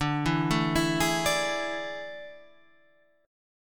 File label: A Major 7th Suspended 4th D